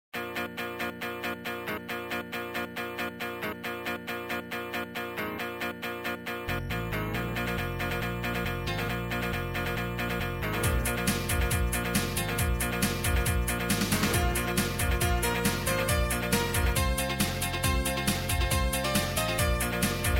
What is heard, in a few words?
Guitars; fasttracker